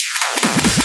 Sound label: Loops Fills; Vengeance Samples